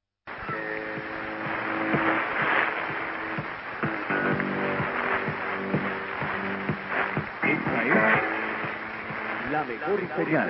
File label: 4747 kHz, Peru; R Huanta 2000